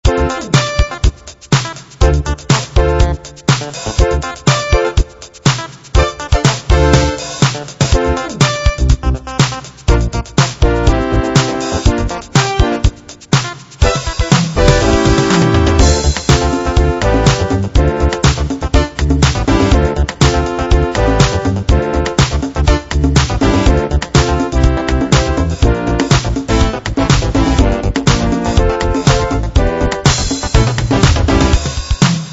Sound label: 90s dance